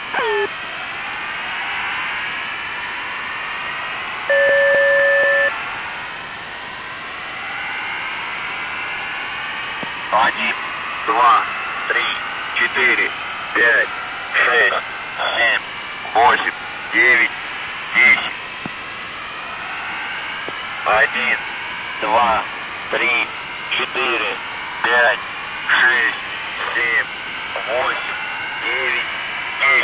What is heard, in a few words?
airhorn